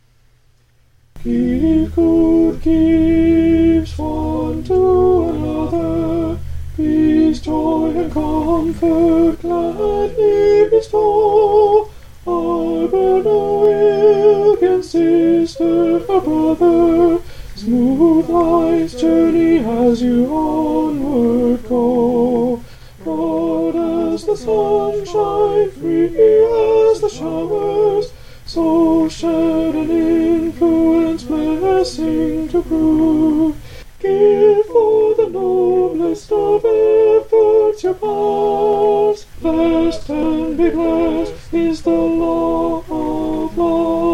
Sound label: alto